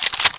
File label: c96 clip load, weapons, ww2; sven; ww2projekt